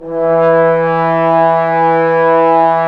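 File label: brass trombones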